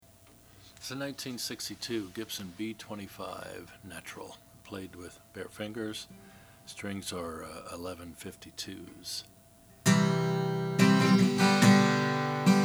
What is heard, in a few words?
gibson b25n natural